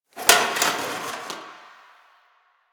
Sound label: Box Of Trash Rustle